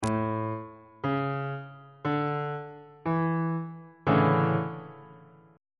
e double flat major 7th suspended 2nd 2nd inversion on bass clef